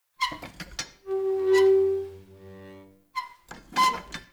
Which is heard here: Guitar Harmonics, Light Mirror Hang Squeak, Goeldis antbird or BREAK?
Light Mirror Hang Squeak